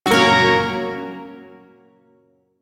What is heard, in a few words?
Shawzin Yo Chord; Warframe; Duviri Shawzin